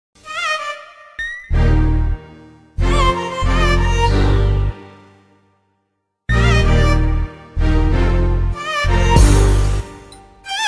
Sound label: pitched violin; ringtones; hip-hop